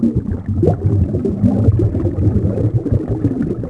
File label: lava flow; The Elder Scrolls III Morrowind GOTY; XBOX